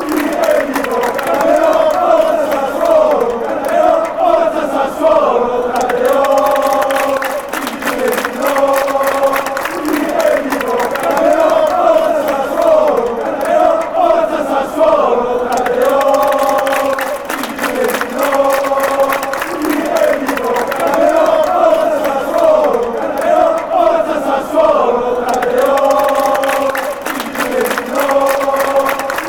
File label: ill sing fan chants